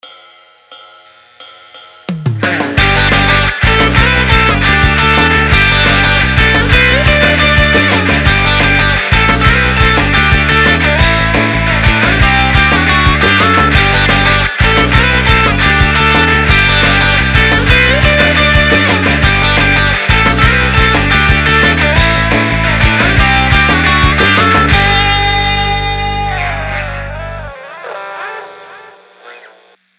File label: guitar test